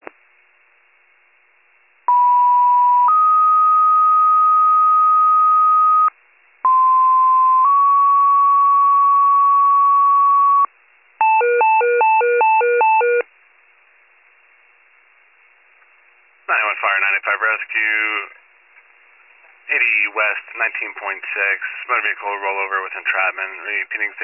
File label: fire, pager alert